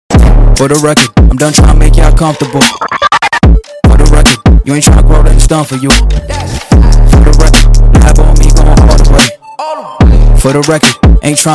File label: hip hop; fortnite emotes